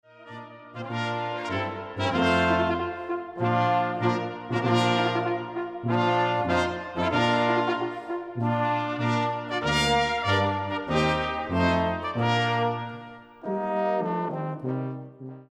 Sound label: Bridal Chorus; Lohengrin